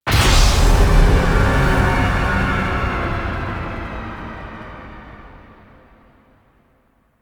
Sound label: Organ Stinger All Vent; Encounters; Ode Pollution Tower